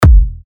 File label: E, techno kicks; Unilateral; Revealed Techno Kicks Vol. 10